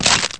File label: egg crack; Stanley's Sticker Stories (1997)(Edmark)